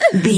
voice female hurt pain mild

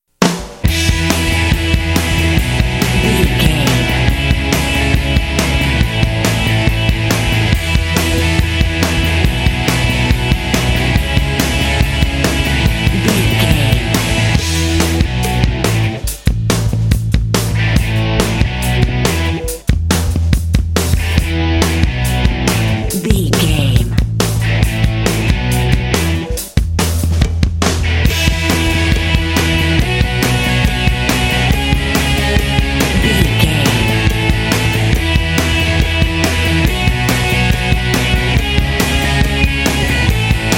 pumpin indie alternative rock energy fun

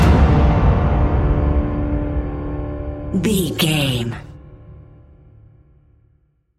terror embrace stinger

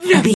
voice male effort action release

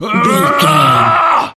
voice male battle shout

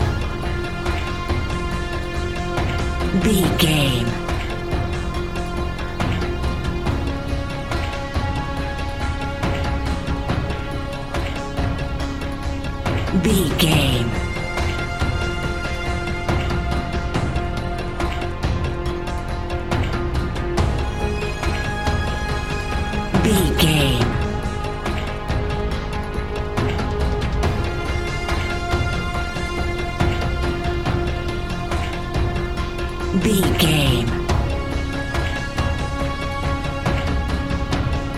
expanding granular tension; Expanding Granular Suspense 30 Sec; Catch 22 Music Network

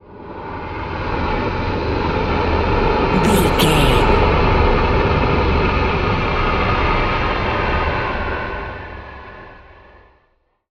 dark portal wind effect

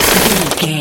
gun auto barrel spin fast stop

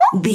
voice fun small character emote happy

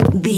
footstep woodland

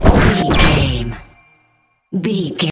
spell harness magic

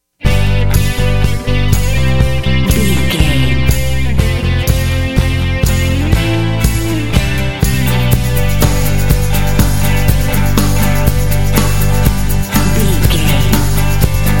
country rock jingle drums

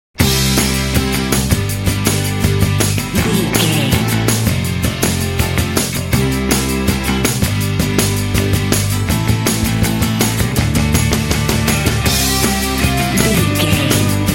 pop rock

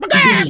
chicken cluck scream long

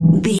ui menu button error

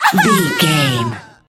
voice female death intense

